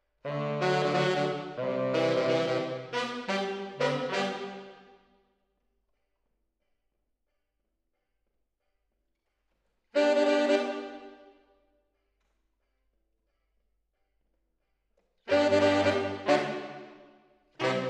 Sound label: MKH AB, piano